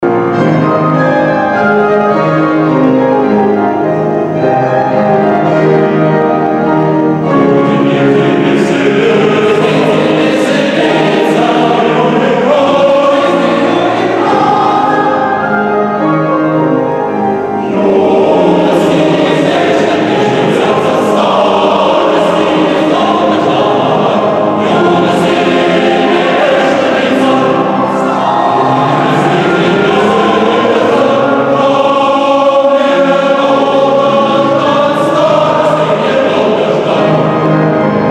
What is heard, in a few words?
male choir; budem pit i veselitsya; male choir of mephi